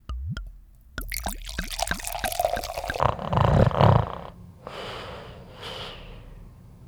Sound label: soda snore; fftsplice